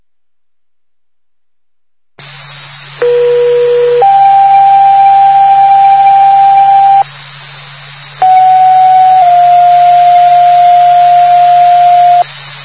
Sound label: stabbing